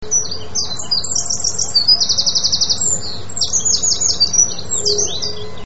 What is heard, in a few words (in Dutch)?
winterkoning; vogelsflevopark